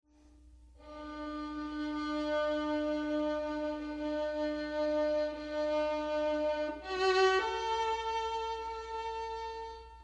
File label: Lullaby